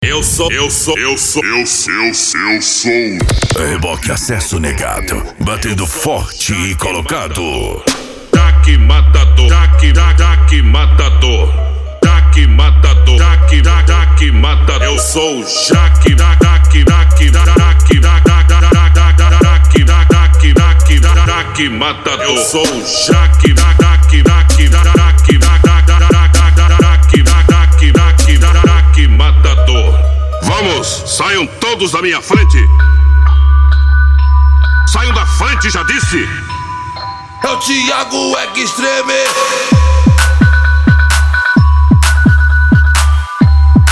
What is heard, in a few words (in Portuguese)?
racha eletronica e funk mega bass